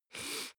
nose sniff male sick soft; koerpergeraeusche; menschen; soundpool

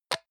hygiene small cup open hard; haus-garten; badezimmer

